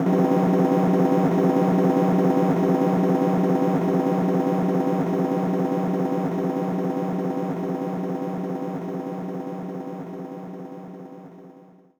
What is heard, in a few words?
Drum Roll; gamerip; the legend of zelda phantom hourglass